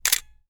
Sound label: camera shutter click; mechanical